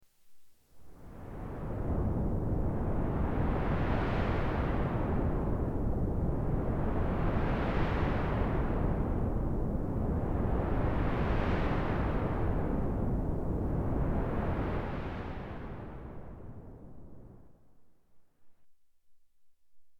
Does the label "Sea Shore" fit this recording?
Yes